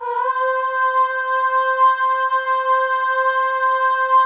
AHS, FEM; Spectrasonics; FEM.1 AHS

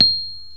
PNO, Rhodes Mk.V; Keyboards of The 60's and 70's

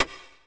tech fx; Best Service; Hyper Dance; EFFECTS

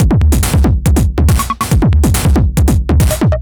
retro drum machine Loops 140bpm; Copicat

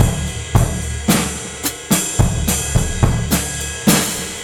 Slow n' Bulbus 54 bpm, breaks; Zero-G Beneath the Planet of the Breaks

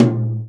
tom, Real Drum Kit, jungle kit

